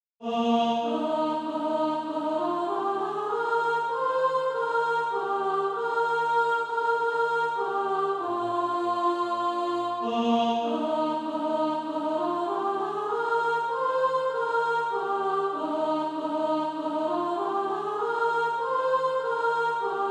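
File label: soprano; A Song of Praise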